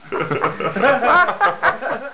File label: laughs